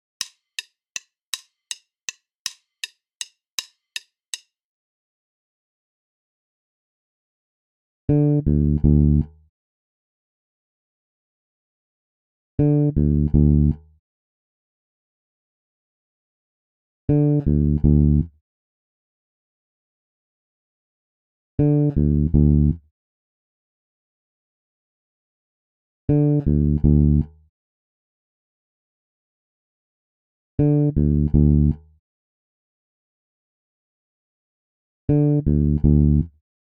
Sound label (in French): decalage triolets exercice basse tempo 080